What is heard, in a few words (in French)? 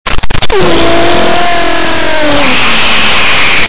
sw vaisseau; Star wars